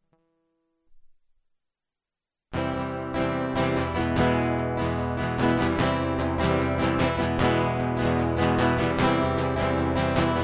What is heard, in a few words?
Guitar Prequel